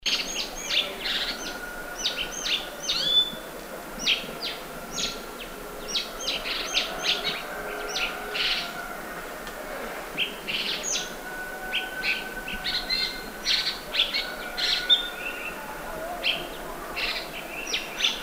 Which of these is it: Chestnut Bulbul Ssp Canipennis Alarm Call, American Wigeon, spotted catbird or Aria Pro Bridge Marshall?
Chestnut Bulbul Ssp Canipennis Alarm Call